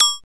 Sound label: KLINK, METAL & WOOD; Roland - LA Composer vol. 1